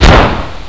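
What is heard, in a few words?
HK submachine gun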